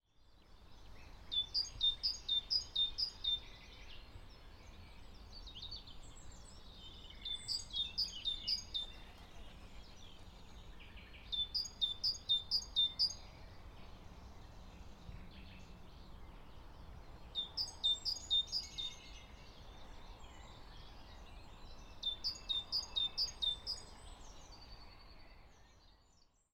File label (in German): Ruf Kohlmeise Parus major